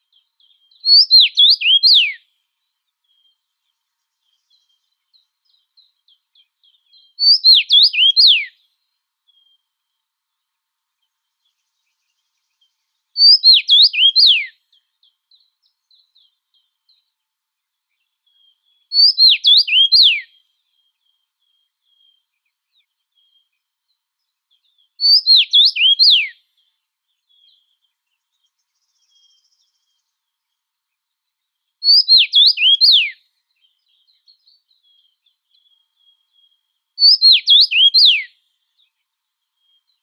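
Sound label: common rosefinch, Carpodacus erythrinus; chechevitsa obiknovennaya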